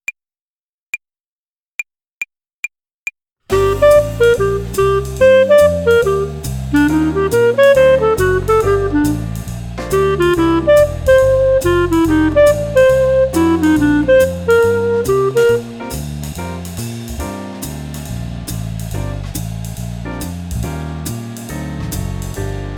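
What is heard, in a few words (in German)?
Swing Phrasierung Übung; play along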